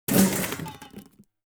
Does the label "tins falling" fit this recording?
Yes